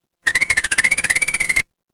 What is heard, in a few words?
mechanical gear